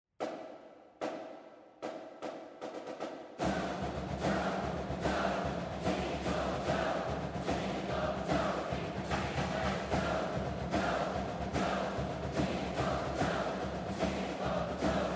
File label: Drum Cadence; Southern Miss